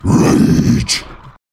rage

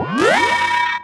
revival synth; octonozzle

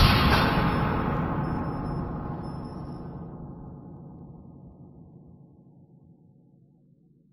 power outage; votv

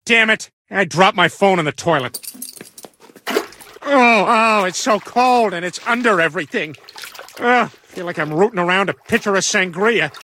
dropped my phone

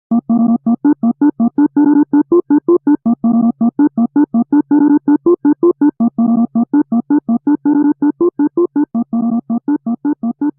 hammer theme; smash bros

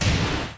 confirm; tekken7

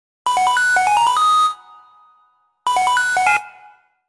ring

